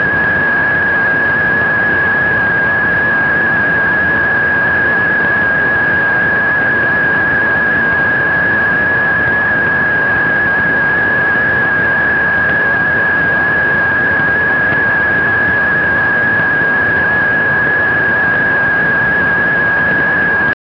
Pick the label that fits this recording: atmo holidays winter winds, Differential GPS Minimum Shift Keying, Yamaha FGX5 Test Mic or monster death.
Differential GPS Minimum Shift Keying